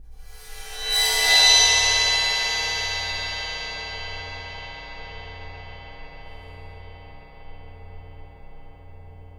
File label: Void Perc; The Void; Processed Drumkits